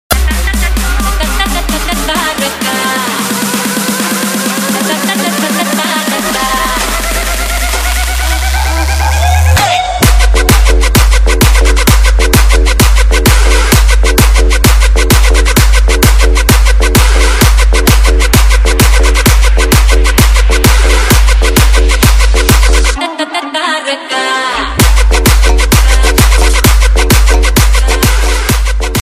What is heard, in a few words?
edm mix dj song ringtone